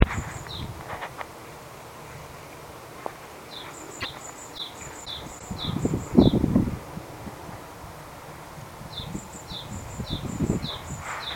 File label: great tit